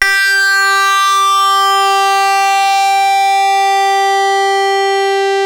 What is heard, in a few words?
SYNC HARD G4, LEAD; Music Store - Claudius Diemeter Schwerlos